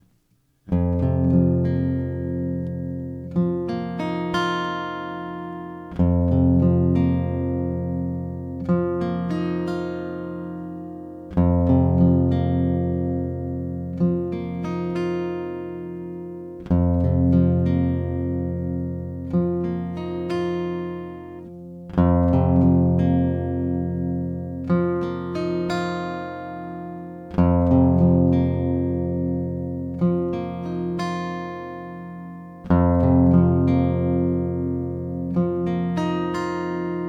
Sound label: F 90 Old Strings GTR arps dry